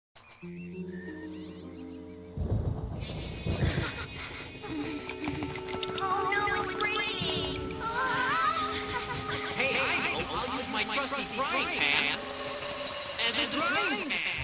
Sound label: frying pan